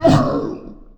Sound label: fist pound; finalmother